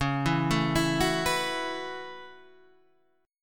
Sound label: B 7th Suspended 2nd Suspended 4th, chord